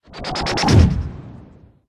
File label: buyaka buff, pets; buyaka4